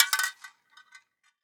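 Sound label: Spray Paint Can Drip; overte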